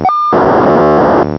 pocsag

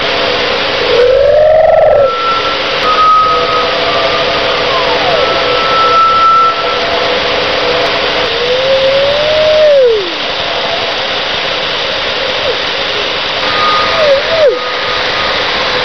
Random Doppler Tones Wind

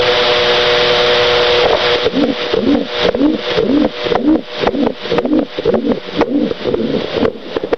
Walking 20 to 40 Feet

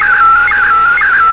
wefax, scanner

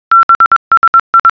Start Alert; BSI

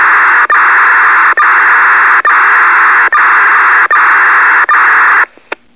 otar

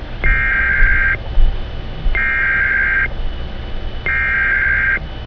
eas, scanner

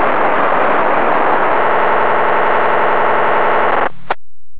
trunked; astro

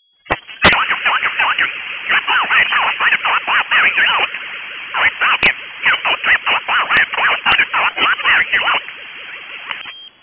falcon

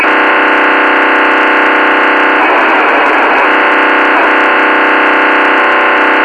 mdc4800, scanner

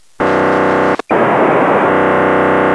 mdc4800, scanner

